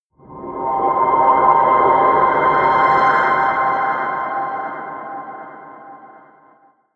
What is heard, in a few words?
air wind choir high, drones